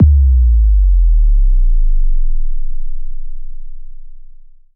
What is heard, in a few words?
Sub Kicks, Bassdrums